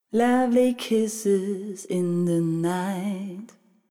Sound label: Kisses, jazz; Jazz (Джаз)